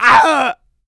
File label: pain large, player; DoomRTX; huge